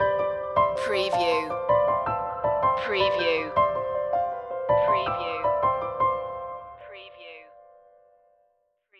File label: pulse stinger